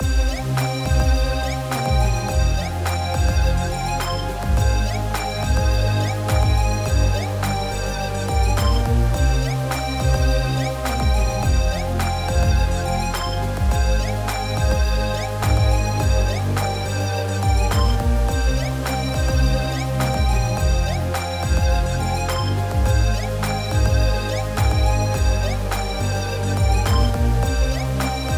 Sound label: Hip-Hop